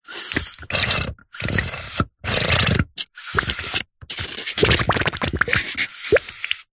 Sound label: balloon pump in slime gunge release air hiss bubble; zapsplat